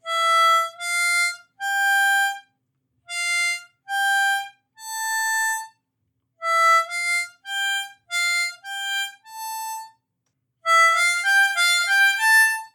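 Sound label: Triplets Ascending, harmonica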